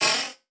Multimedia Internet CD-Rom Flash Ding

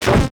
Buttons Mechanical; Adobe; Multimedia Internet CD-Rom Flash

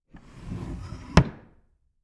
Foley Wood Table Drawer Sliding Closed

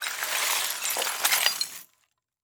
Crash Glass And Metal Debris Sliding On Concrete; Crashes

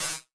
Multimedia Internet CD-Rom Flash Pop Up Short

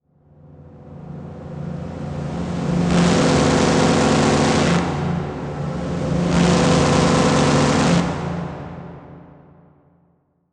Production Element Title Transition Bed

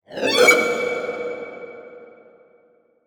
Transition Hit Impact; Production Element

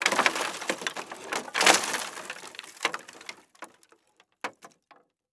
Crash Wood Debris Dropping On Wood; crashes; AdobeCC

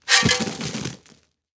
Impact Dirt Fall Off Shovel

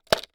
Household Pen Drop In Cup

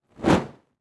Fire Torch Movement Fast Pass By; Fire and Explosions

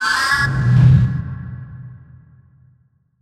Title Transition Evolver Short; Production Element

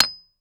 Industry Tool Chisel Hammer Concrete Hit

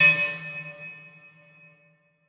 Transition Hit Impact Metal; Production Element

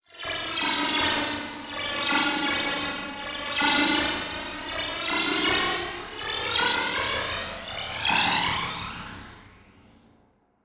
Transition Whoosh Multiple; Production Element

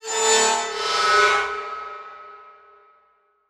Transition Metallic Swell

